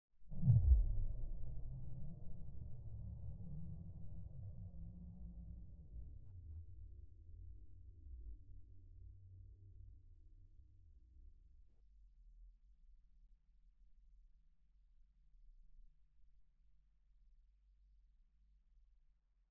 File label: crunch woomph